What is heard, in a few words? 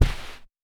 Carpet